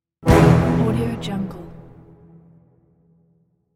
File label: cartoon animation closer accent cue